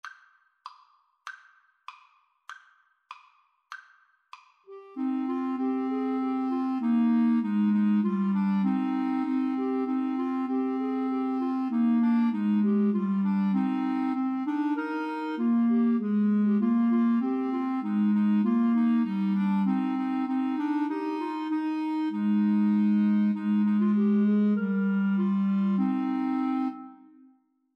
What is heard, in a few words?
Clarinet Trio